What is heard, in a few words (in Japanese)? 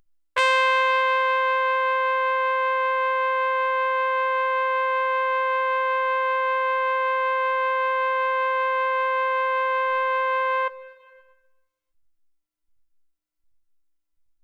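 trumpet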